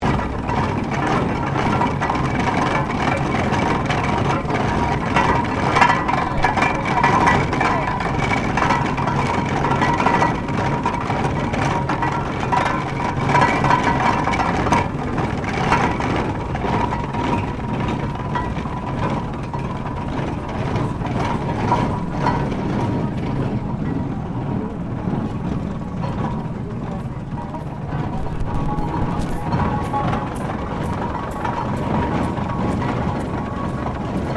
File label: Tractor steam traction engine 1900 operating; John Fowler